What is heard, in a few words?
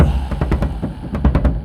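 Music Shield; From Dust; ps3; xbox 360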